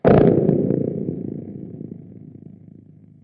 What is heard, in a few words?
dead flappy string, guitar noizes